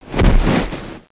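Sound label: body hit; Total Chaos Nukem 3d Pack; duke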